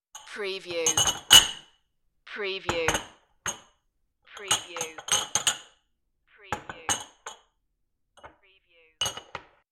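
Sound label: Stacking Glass Long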